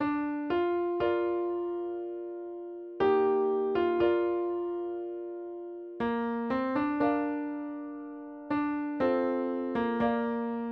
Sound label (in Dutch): Voorspel